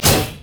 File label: arc; Diana